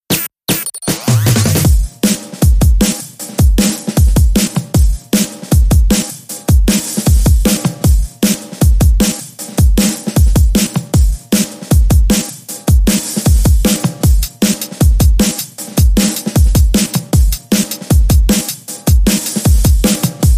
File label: Asocial Beat